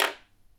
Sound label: put